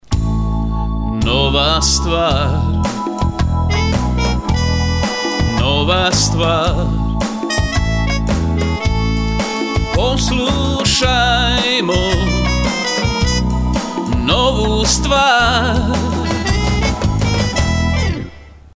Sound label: Jingle; Nova Stvar najava